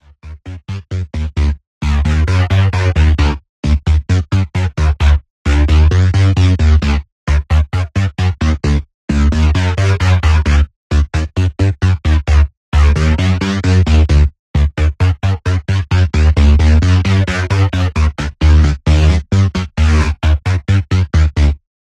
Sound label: bass